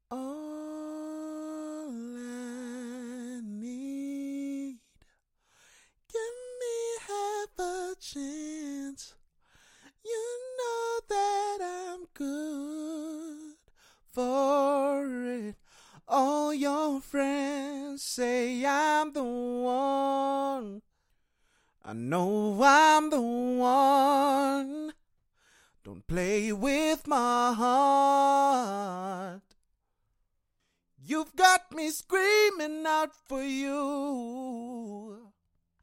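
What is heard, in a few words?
acapella, pop rnb 116 C sharp minor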